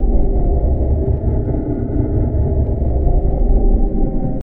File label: Amb Eerie Grave Int Loop